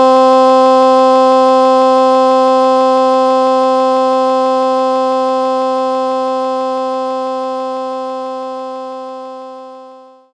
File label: Solo Vox C4